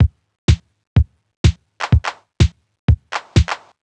drum past stripped 125, Drum Loops, Classic Chicago House